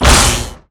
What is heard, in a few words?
NPC Hit